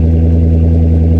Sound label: Toyota Supra 2020 Idle